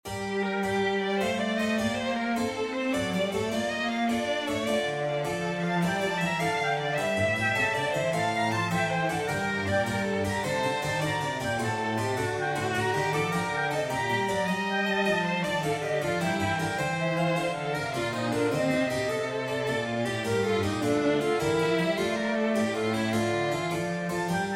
Trio Sonata g minor Allegro moderato, Alto Recorder, Violin and Continuo